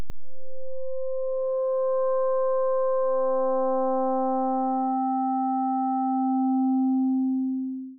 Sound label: output 1 voice